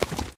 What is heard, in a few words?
Horse Footsteps; Legends